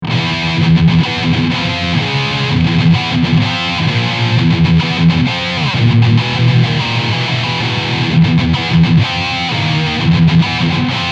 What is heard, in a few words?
Mid Scoop